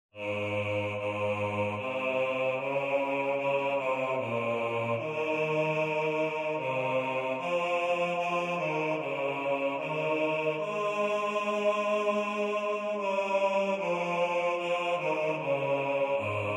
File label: BASS; Bereden vag